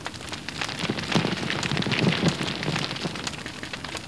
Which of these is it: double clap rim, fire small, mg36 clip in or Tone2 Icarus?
fire small